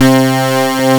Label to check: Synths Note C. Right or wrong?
Right